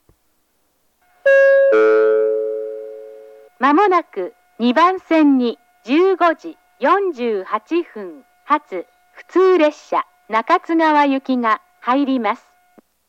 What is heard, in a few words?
hirooka local, station; jr-east; nakatsugawa